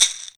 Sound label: Perc, Alesis DM5